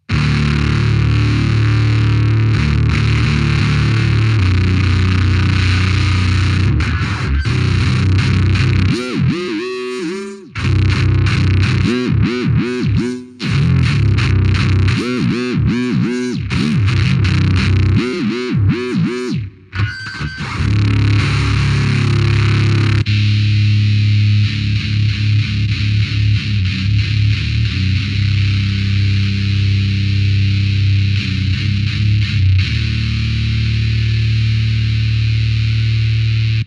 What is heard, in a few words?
Guitar; Retarded Guitar Shit